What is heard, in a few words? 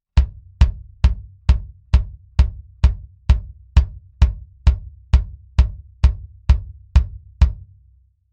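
bass drum Mic Test M201 Mix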